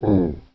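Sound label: zombie attack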